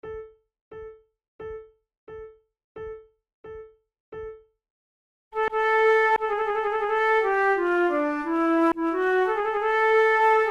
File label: for two flutes lallemande; Monteclair; 6 concerts; flute tunes